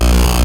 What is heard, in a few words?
Pit hit, ATARI